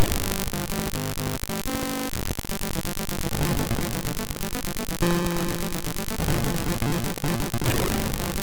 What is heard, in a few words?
Intro, MOS6581R3